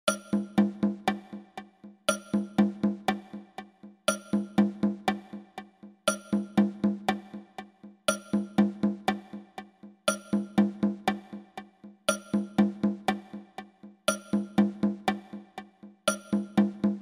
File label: syncopated 8 4 2 1; tunesmithy